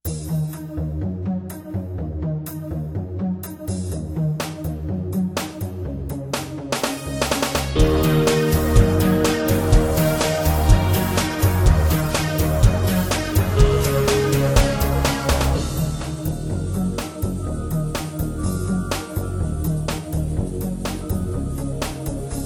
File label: Third Groove